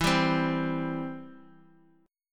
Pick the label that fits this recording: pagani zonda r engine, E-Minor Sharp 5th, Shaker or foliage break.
E-Minor Sharp 5th